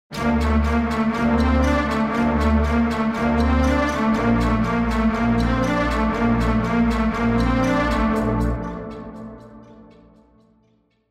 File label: Analog Strings Ticking Clock; Output